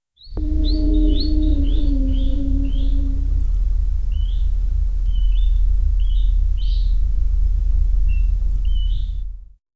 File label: cinematic intro sound for, rural